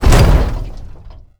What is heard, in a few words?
impact car medium